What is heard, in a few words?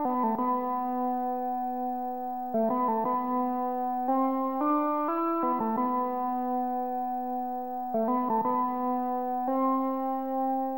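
organ, D minor, Hip Hop & RnB; Hip Hop & RnB 3; Love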